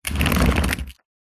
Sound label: household vacuum cleaner roll on tiled floor while off short